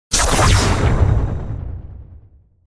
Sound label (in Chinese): 打斗 传送